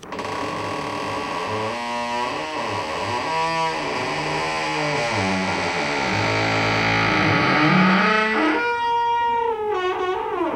Door creaking

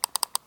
Mouse Click Double

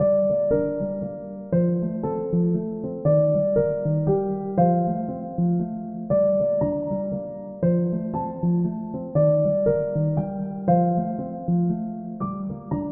Dreamy Loop

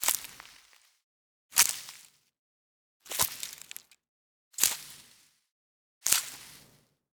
Harvest Sounds

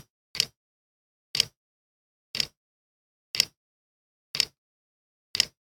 Clock Ticking

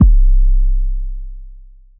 808 C

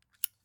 Zippo Open

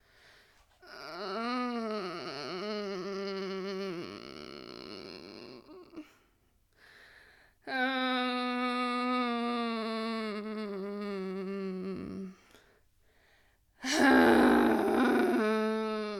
Female Groaning In Frustration

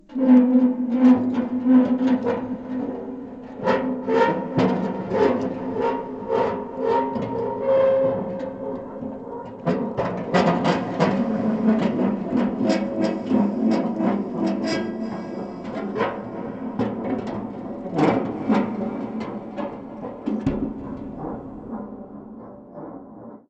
Creepy Metal Creaks

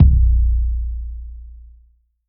Techno Bass Drum

